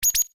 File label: UI Rollover Major, User interface feedback sounds; Computers; Electronics